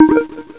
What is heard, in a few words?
Spinner; Bombzuka; Retro